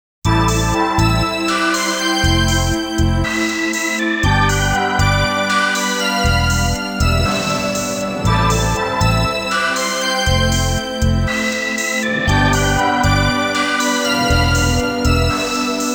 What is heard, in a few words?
Lose; Initial D Arcade Stage; sega